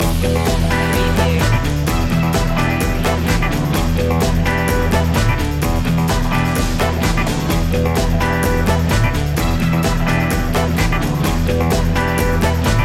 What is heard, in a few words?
street grind loop